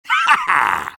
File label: sniper taunt flip exert